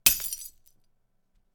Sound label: Glass; FXHome